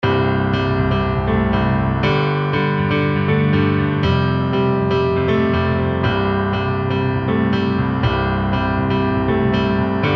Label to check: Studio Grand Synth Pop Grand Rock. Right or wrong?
Right